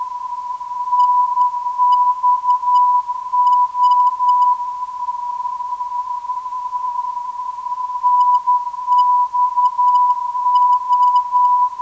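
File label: 326kHz, non-directional beacon; NXO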